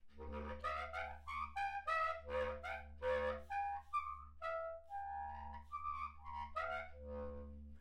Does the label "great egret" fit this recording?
No